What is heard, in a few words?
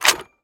12mm nsvt mech start, guns tanks